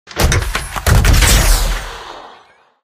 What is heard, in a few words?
eject sfx